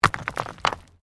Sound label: STONES MOVE; Material components for constructing more complex sounds; Rocks; Materials